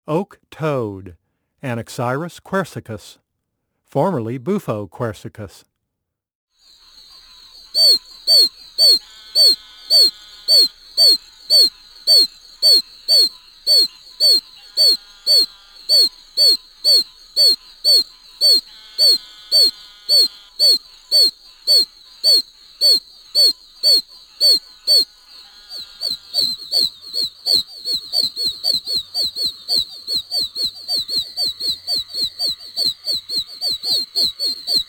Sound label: Oak Toad Call